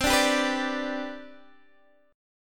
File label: C Major 7th Suspended 2nd C down Guitar Open D, chord